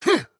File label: Attack Small; Black Adam